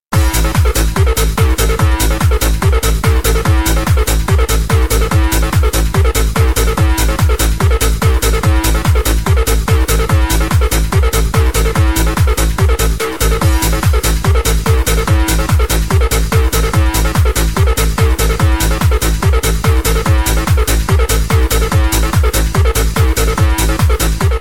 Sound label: Rave